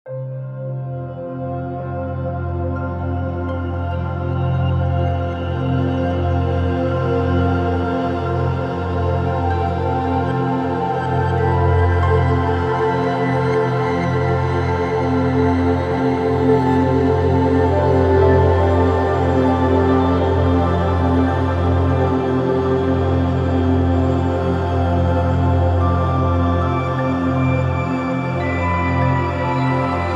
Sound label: Galaxy Harp; Kompose Audio - Galactic Voyager Series: Arcturus Nova